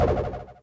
die; hl1; sphere

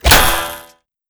chargebow; hl2 weapon

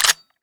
mac10 bolt back; arccw go

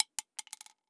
casing 22; arccw; weapons

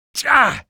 pain, male

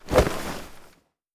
melee miss; arccw

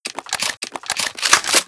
reload shotgun

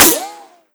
ricochet; arccw

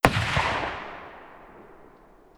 ssg08 fire dist; vj weapons

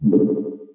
button; hl1; sphere

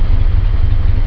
abrams idle loop, tanks; hl1 npc

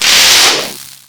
vort attack shoot; hl2 npc; ep2

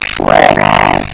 grenade throw; hl1 npc; shocktrooper

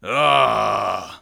primal roar; bodyguard; hlsc

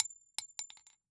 casing 9mm; arccw; weapons

